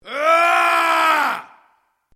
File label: MALE ROAR AGGRESSIVE SHORT; male vocalisations; Yelling; Voice